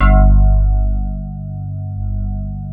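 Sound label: digi rhodes, left; Sound Gallery - Charged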